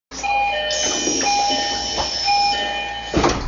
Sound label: metro door